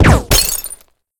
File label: Ricochet sms